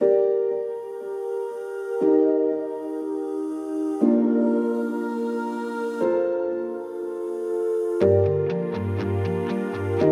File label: Dreamy Atmospheric Pop